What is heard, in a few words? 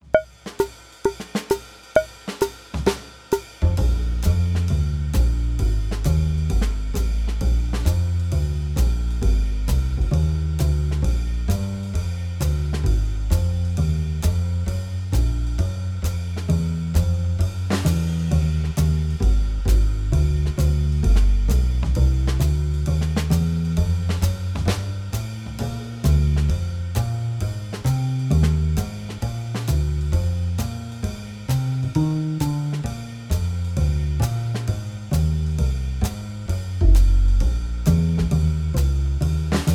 Improv Backing Track Subtonic Blues NO PIANO; for compers